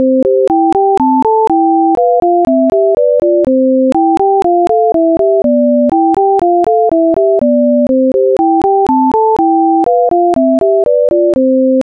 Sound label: twinkle